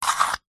battery tester plastic slider slide; foley; zapsplat

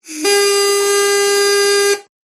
Party Horn

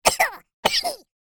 cartoon character cough or could be sneeze x2

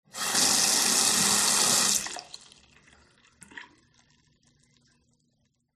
bathroom tap

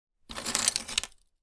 foley tile broken peices movement on table

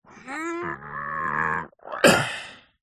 human male voice grunt groan lift heavy weight; zapsplat

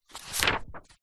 office paper construction sugar page turn book; zapsplat

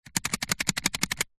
staples box shake; office

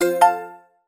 app alert tone

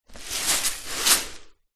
rice dry in plastic container tip movement; zapsplat

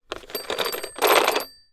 toy baby telephone rotary dial; fisher price; sound effects

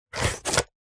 body builder belt tighten do up; sport

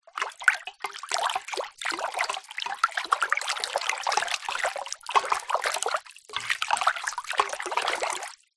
household water stir in metal pan; zapsplat

